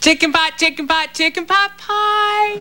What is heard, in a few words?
chicken; chicken pot-pie; Just Shoot Me